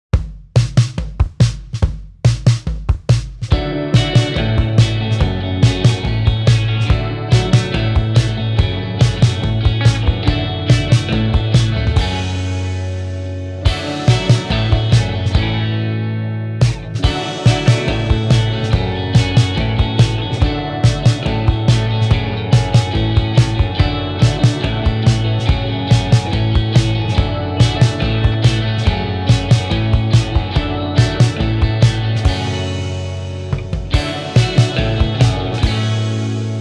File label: inst